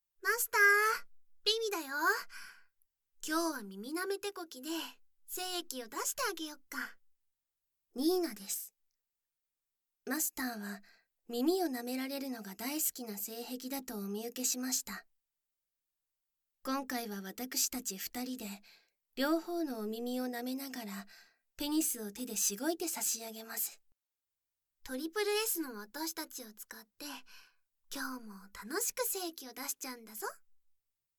両耳舐め手コキ; 奴隷美少女の両耳舐め手コキ♪

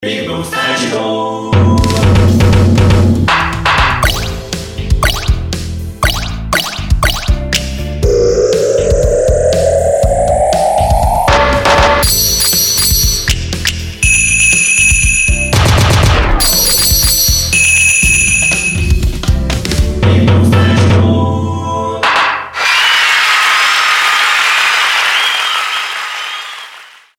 音ゲー; ぴぐみょん効果音素材集; ぴぐみょんスタジオ

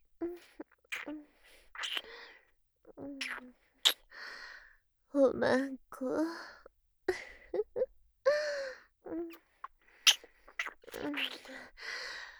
おま○こ キス

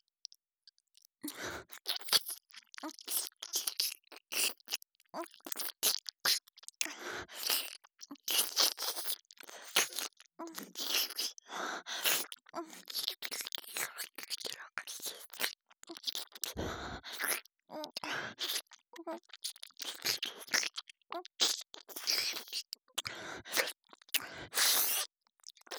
強めにすすり上げながら、れろれろ舐める

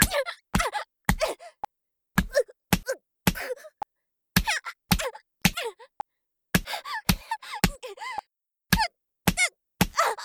ジャブメト BPM110 ワンツースリー, メトロノームあり

